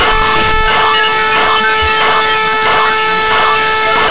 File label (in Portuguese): metro mais 440hz